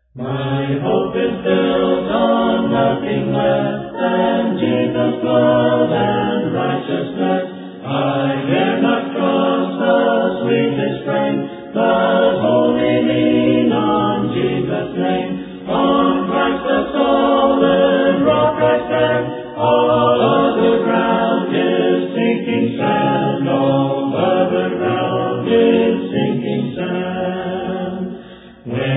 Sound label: a capella hymn